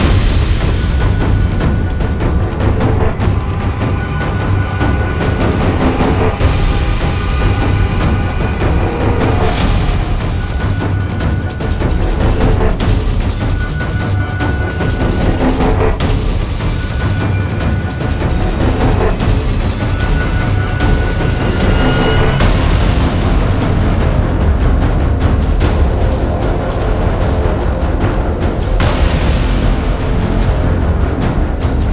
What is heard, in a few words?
Base Loop low